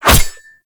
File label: Machete Whoosh